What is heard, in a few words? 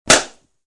bursting balloon